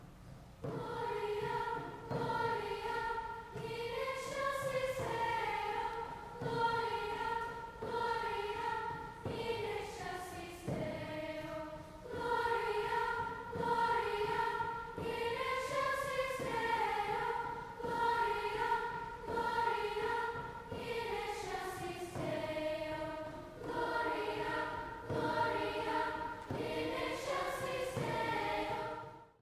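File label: Medieval Gloria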